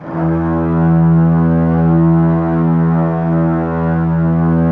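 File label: violins, low violins; Q Up Arts - Denny Jaeger Master Violin Library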